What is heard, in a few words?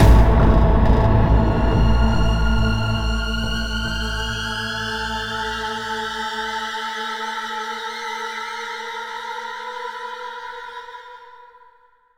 Ambient Sting; Prototype; xbox 360; Behind the Glass